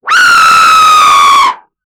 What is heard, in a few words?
fast tight whoosh; air